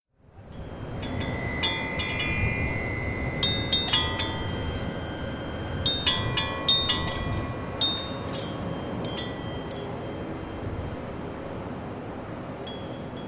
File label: aeolian device mono bow; max eastley s